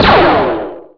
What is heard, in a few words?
alien laser